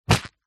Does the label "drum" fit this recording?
No